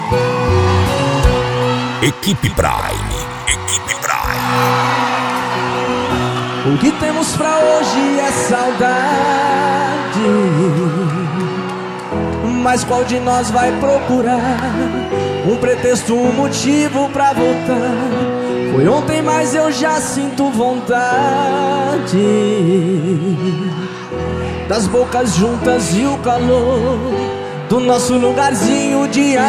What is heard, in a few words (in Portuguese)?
moda de viola; frequency mix